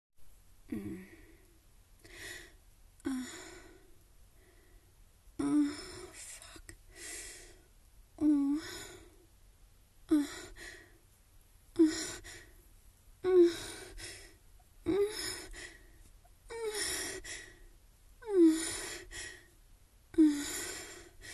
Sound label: Moaning warm up scale